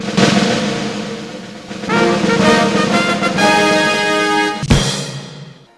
Fanfare

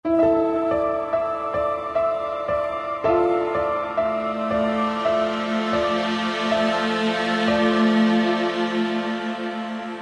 Harbour Sting; ether one redux gamerip 2015

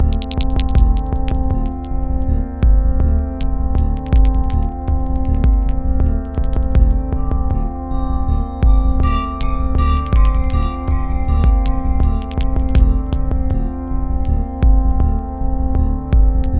Store Loop; The Binding of Isaac Rebirth Afterbirth

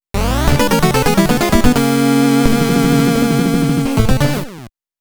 OVER (Game Over); Contra Chronicle Vol.2 The Beginning of the Legends

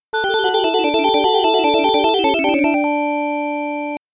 Stage Clear; Boss Cleared

